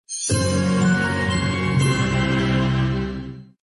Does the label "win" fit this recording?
Yes